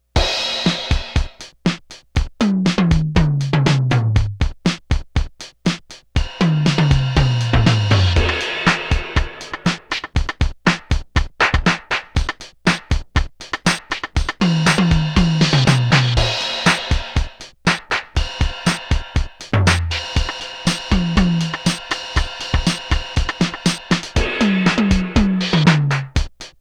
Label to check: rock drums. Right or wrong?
Right